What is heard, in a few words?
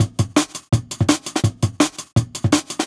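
Jungle Breaks, 167 bpm; Original Jungle Breaks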